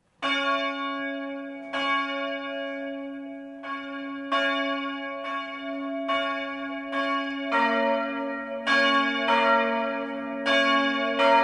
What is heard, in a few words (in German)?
Undorf, glocken